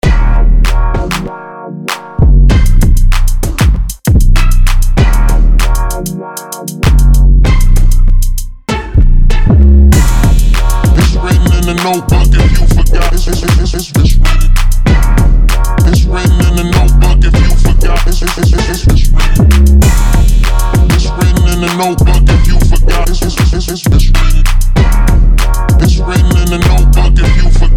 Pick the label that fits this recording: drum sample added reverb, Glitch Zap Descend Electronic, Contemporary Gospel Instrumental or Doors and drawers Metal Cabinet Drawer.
Contemporary Gospel Instrumental